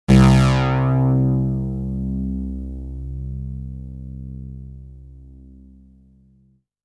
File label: synthlead